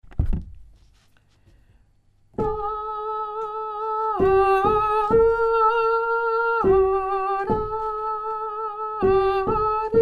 lettre F alto; chorale

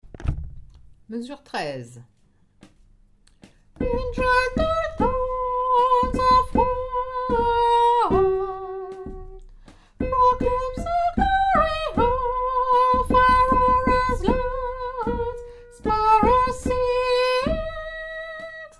tenor

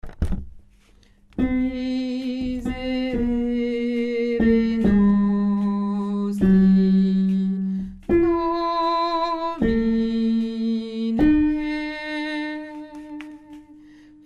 te deum basse lettre L

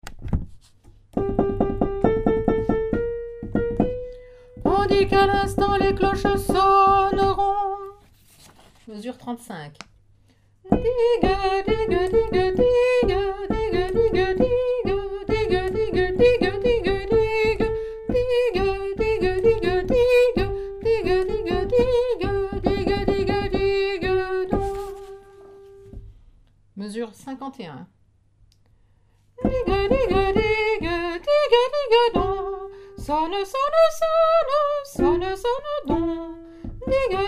Corneville alto

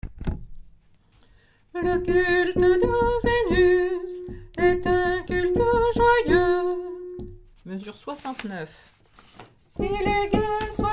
Paris basse

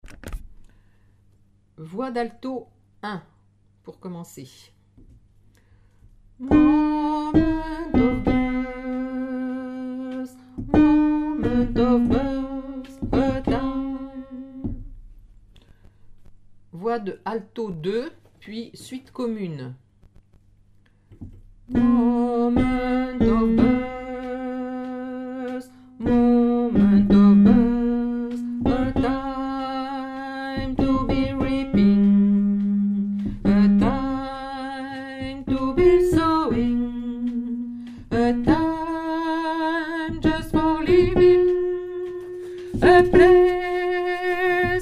alto

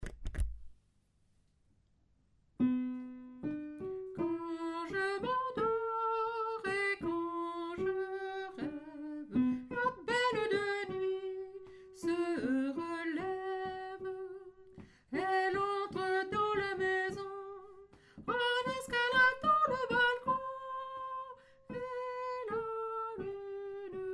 mezzo, choeur femmes

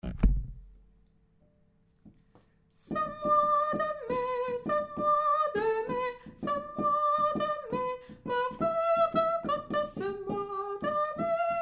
Ce moy de mai Tenor

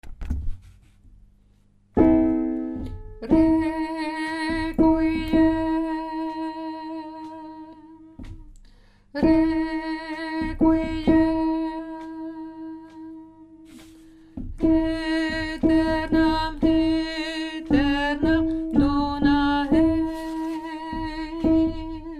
lettre D alto; chorale

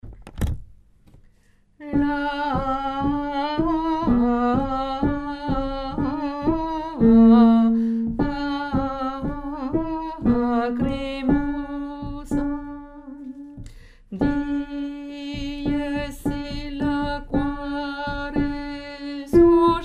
lettre A alto, chorale

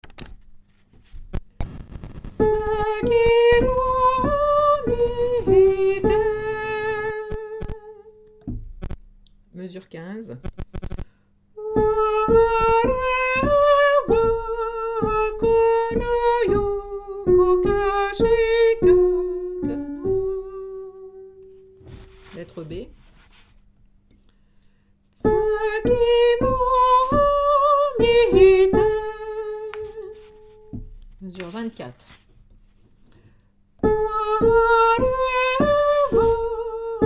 numero 10 soprano

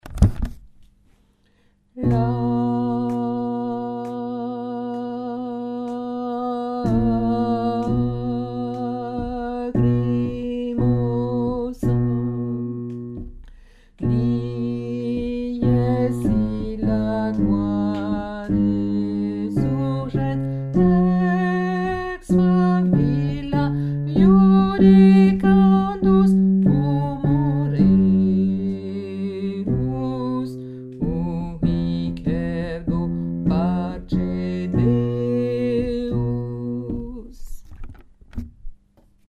lettre B basse, chorale

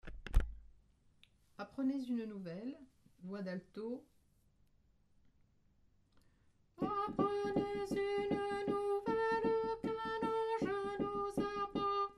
apprenez alto; nouveaux noels; chants noel

